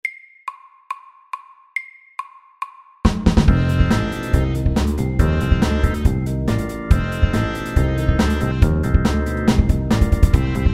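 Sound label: 8 bar blues, fast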